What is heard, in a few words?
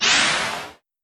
fail; Ben 10 - Alien Catcher